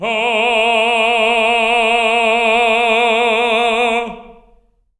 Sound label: TENOR A#3, Voices; Spectrasonics; Symphony of Voices